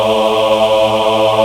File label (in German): Aahh Chor; Best Service Gigapack 1; VOICE